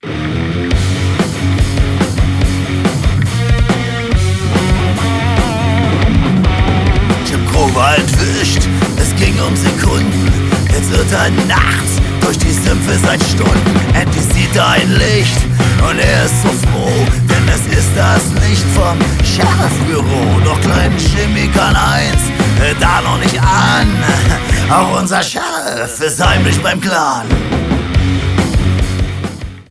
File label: Mississippi Blues